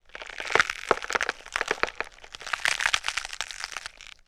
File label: Bone Breaks and Crunch